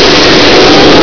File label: Gunship Land; Emperor - Battle for Dune